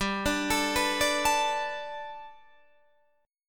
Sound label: G Major Add 9th Guitar Open D, chord